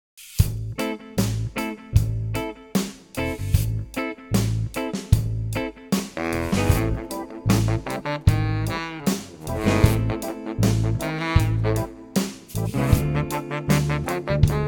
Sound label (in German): Am Strand Reggae